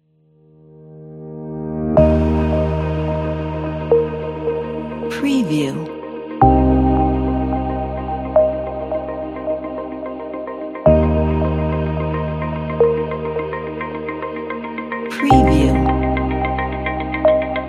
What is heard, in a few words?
ambient music